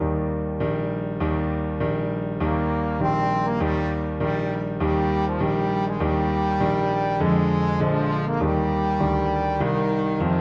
bizet torreador song, trombone

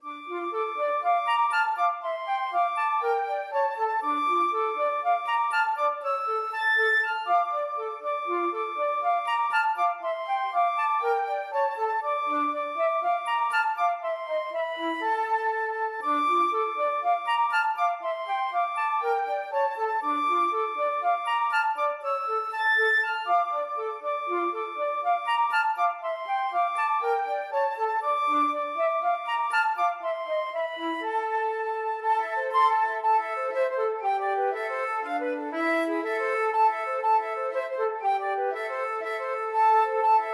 dvorak op98 no.v flute duet

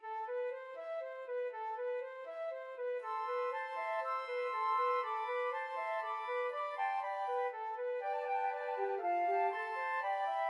Spanish dances, flute trio

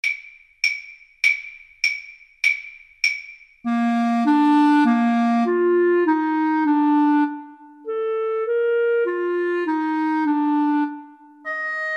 Flute Clarinet Duet; Mozart 12 9

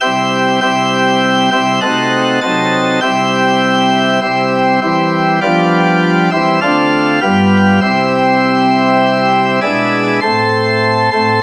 ORG, organ

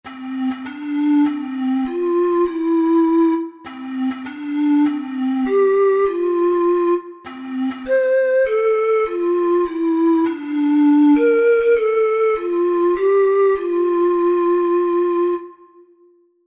happy birthday, ocarina four hole

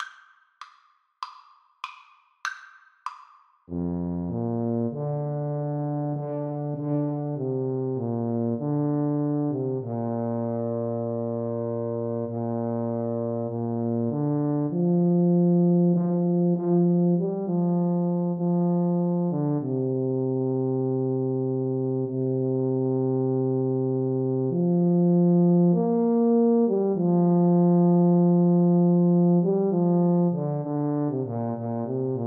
Tuba duet

